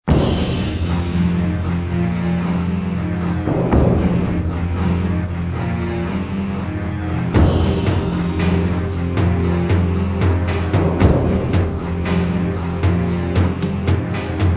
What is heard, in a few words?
low string